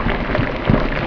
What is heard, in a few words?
Bowl Pour; Playable Tutorial Level; TR2